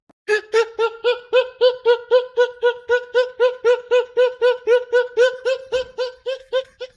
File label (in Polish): smiech